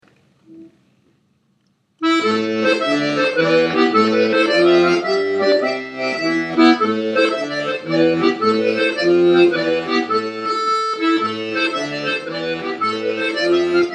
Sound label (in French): accordeon; Rondeau des chiens battus